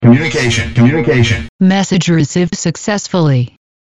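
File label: Communicator Msg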